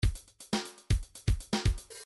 funk 1bar

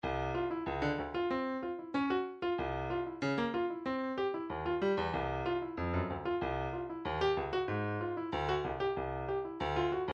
Percussion Bongos, midi; Stylus

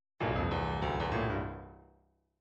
midi fills rock

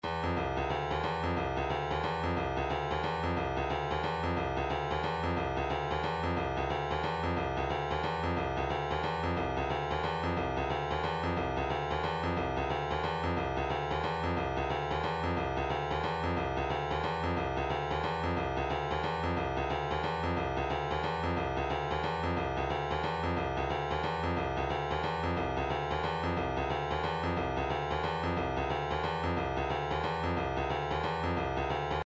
vocal planet hippy 4 4 60 bpm, midi; Vocal Planet 180 Hippy 4 4 (=60 Bpm)

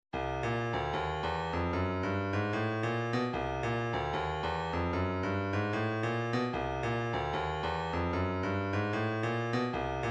150 snare, midi

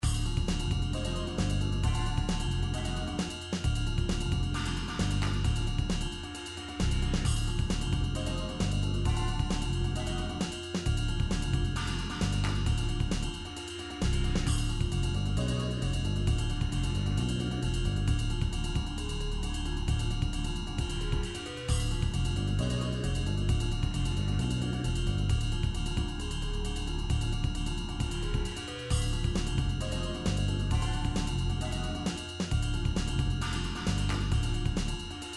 background music; Gameboy Advance; Mortal Kombat 5

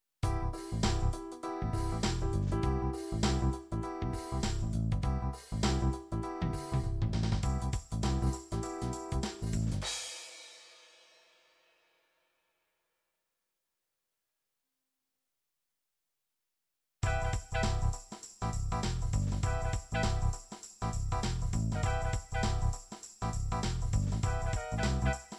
dance funk

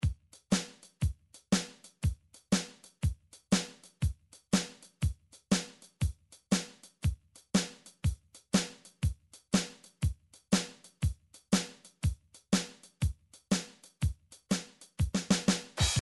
midi drum patterns drums

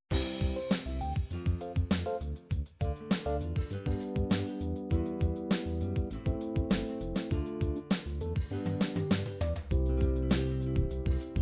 16 beat, midi

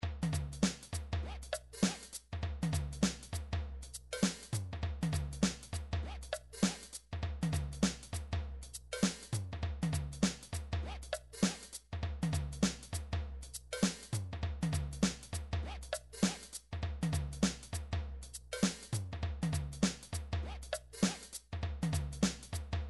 midi drums rap

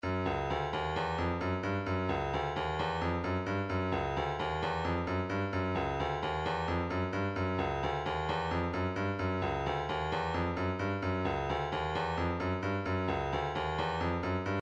131 hihat

